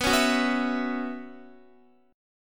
B Suspended 2nd Suspended 4th, Guitar; Bsus2sus4; strummed; x,14,11,11,11,11

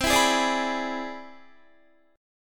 C Augmented Major 9th C down Guitar; strummed; JGuitar

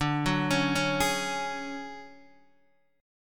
D Major 7th Flat 5th Guitar DADGAD, chord

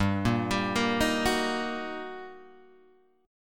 G Minor Major 7th Sharp 5th G, Guitar Open G; chordshape; down

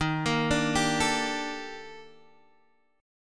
D sharp Major Sharp 11th, Guitar, Open G

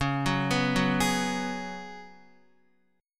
Db 7th Suspended 4th Guitar; x,4,6,4,6,4; 4F,4A,5C,5G,5C,6E; arpeggiated; chord shape; JGuitar

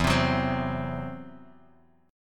E Suspended 2nd Sharp 5th E, Guitar, Open F; 4,7,6,7,x,x; strummed; JGuitar

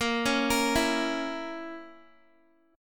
A sharp Diminished Guitar, chord; A#dim; arpeggiated; x,13,13,15,16,x

